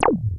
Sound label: wasp sting; Masterbits; Vintage Voltage; SEQ-FX